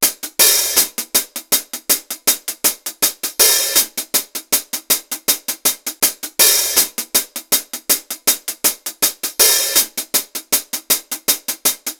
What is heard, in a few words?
Hihat Acoustic 160 bpm; Loops; Drums; Top; Ableton Live 12 Lite; Core Library